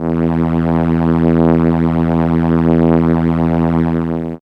Cello E2, ARP Solina; musicradar-synth-samples

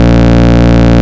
Root A#, Ultimate Bass; Vengeance Samples

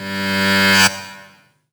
Sweeps Short

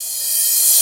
Cymbals Reverse; vengeance essential club sounds-3

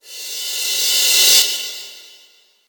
Reverse Crash, Cymbals; Vengeance Samples

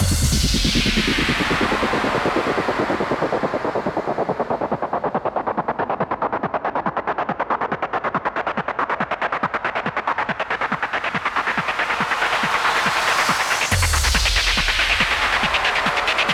140BPM 16Bars, HandsUp & Trance Fills; Whisky; Vengeance Samples

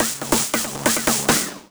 Fill 140 BPM; Vengeance Packs; vengeance essential club sounds-3; VEC3 Loops 140 BPM; VEC3 Fills 140 BPM

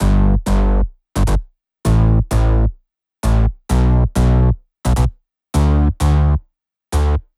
Face to Face Bass Root G, 128BPM; Vengeance Samples; Face to Face Kit

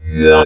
Synth Shot; Vengeance Samples

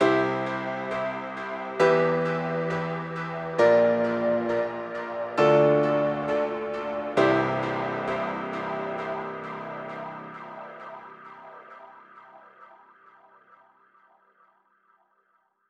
134BPM Piano Outro, C major; Unlooped

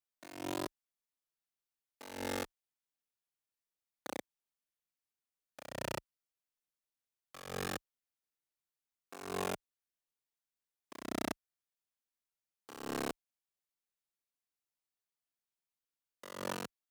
135BPM Bassline, F# minor

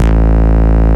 Bass Root A

